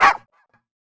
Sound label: bark; chorizo